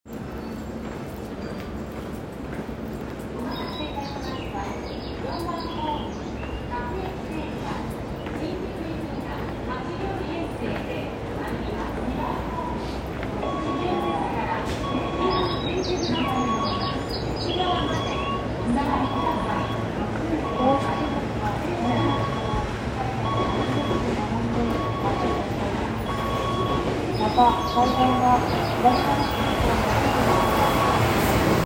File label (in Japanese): 小田急線向ヶ丘遊園東